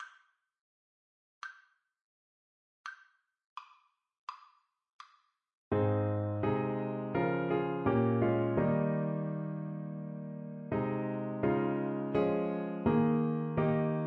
Saxophone

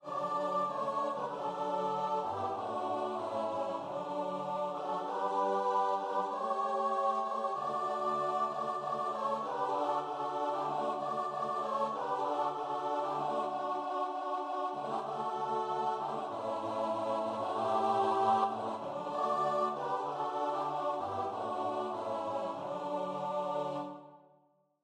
CHOIR; joy to the world

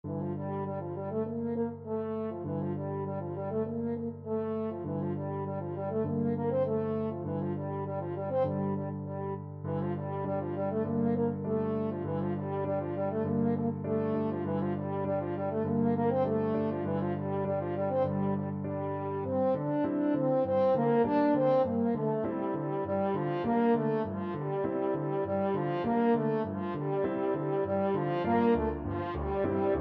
arap, french horn

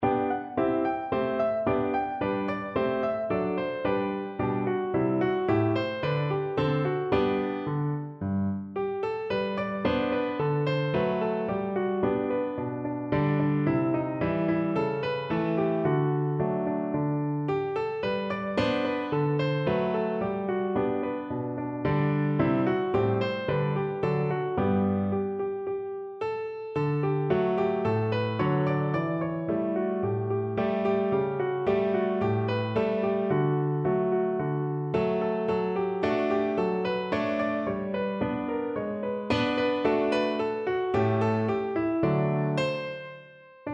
PNO

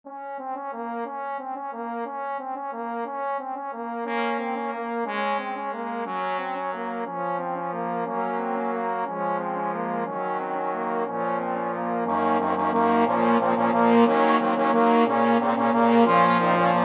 trombone quartet

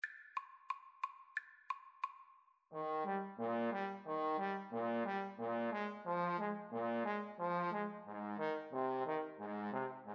Trombone Duet

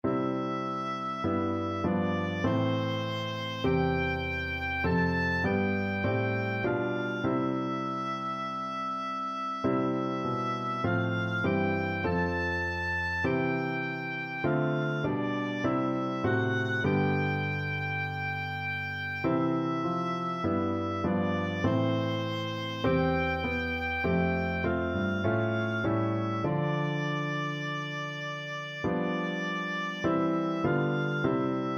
abide with me, oboe

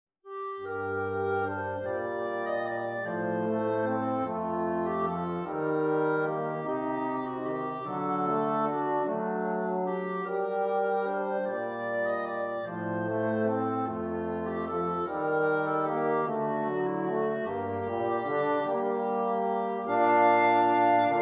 greensleeves, flexible mixed ensemble 3 players

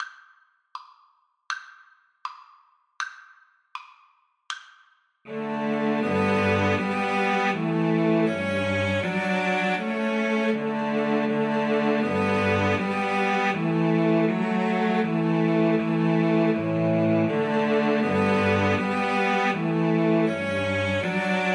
Bach Gavotte Cello Suite 6

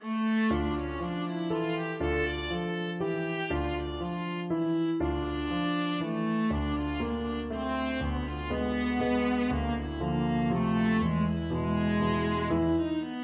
viola

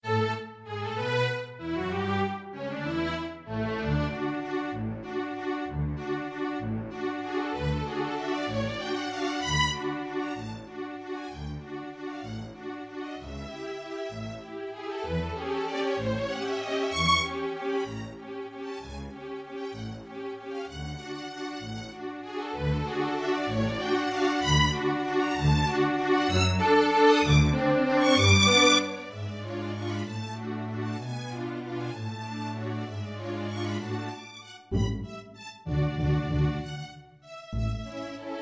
Voices of Spring, string ensemble

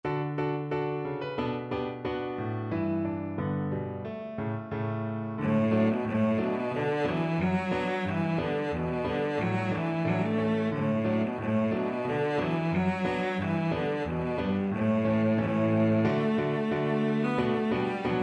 cello

